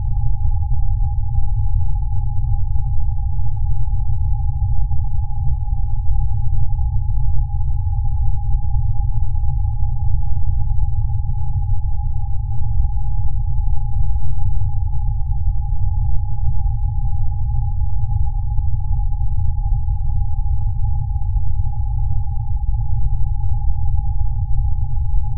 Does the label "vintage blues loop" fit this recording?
No